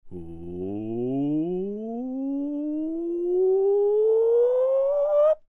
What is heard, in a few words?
Low to High